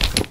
grenade pickup; cdogs

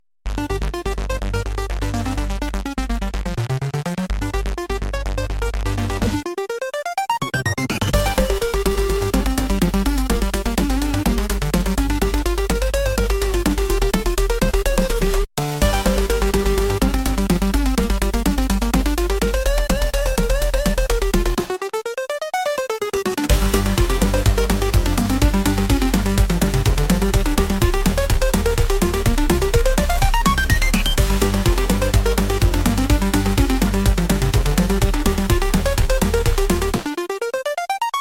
space chase; singe; spacerocks

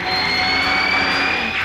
turbo; singe; RoadBlaster HD

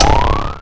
cells pickup; cdogs

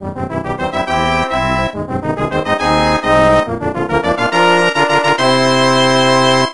stage clear; superbroswar

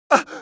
hit, pain; mohaa

